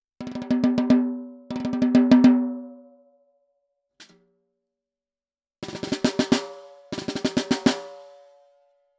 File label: Sennheiser MD Serie MD445 Snare